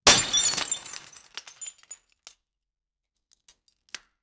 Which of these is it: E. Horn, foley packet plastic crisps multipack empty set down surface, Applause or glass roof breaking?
glass roof breaking